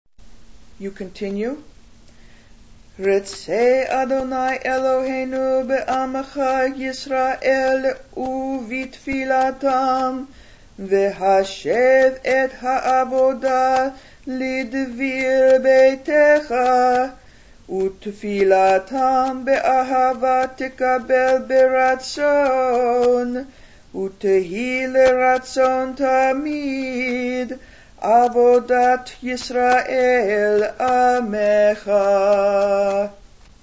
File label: Retzay, Shabbat Shacharit